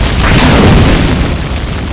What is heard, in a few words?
stab miss, weapons